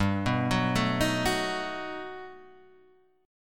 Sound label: G Augmented Major 9th, Guitar, Half Step Down